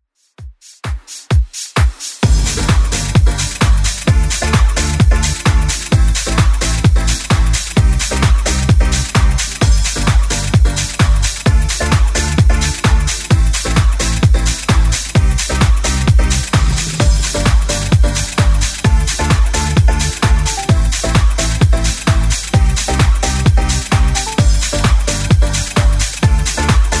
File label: 90s Redrum 130bpm